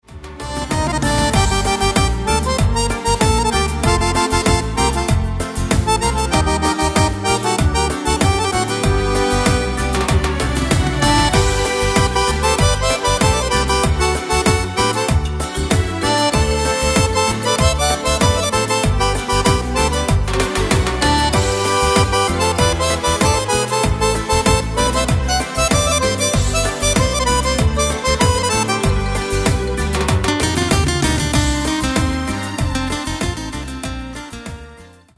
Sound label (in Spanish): Cumbia gitana